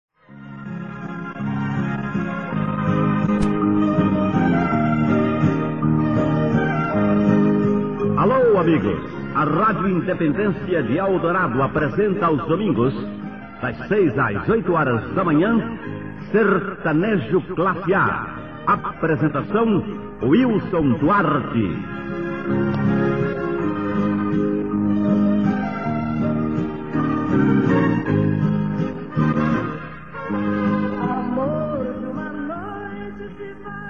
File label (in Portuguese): sertanejo